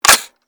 mag tap, m16; guns; sven; cof

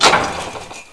door stop

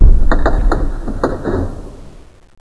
button

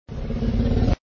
breathe, rex

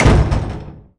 heavy close

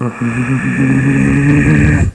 little angry

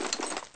spas12 draw; sci arms

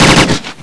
mgun, red

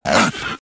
tushkano attack

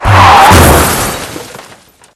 crash

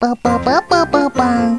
jingles

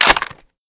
shotgun open barrels; unreal; quad

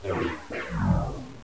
houndeye die

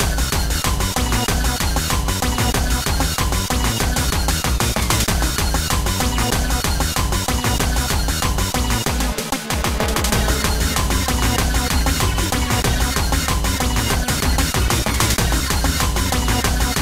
bgm music loop

